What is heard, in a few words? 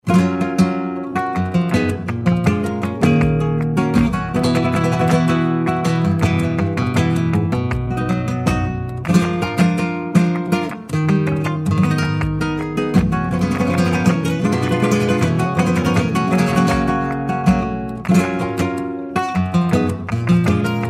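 Alegrias, flamenco guitar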